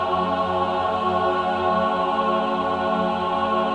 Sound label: min chord, Symphony of Voices